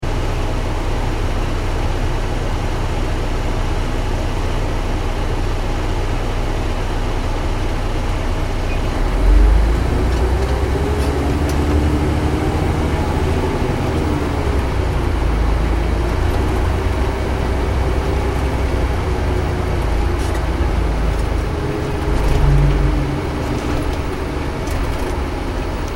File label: transportation bus driving nyc internal, Bus Driving In City (Internal); Bus Driving In City ( Nyc ) - Internal Recording; Busses; Vehicles